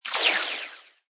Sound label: Magical Projectile Burst Small, Magic sound of casting spell; Magical Projectile Burst Small: Magic sound of casting spell, conjuring or wizardry. Witchcraft ritual summoning elemental